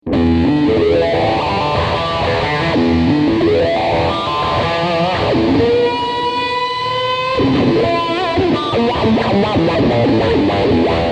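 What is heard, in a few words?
7 string wah; VG 88 v2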